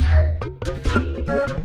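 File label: Music Dpad 145 Maxisphere; from dust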